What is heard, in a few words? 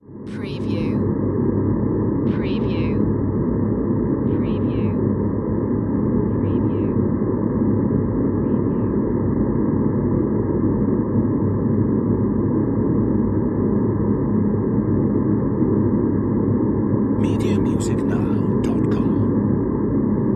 Drone Demonic Low; Sound Effects Bible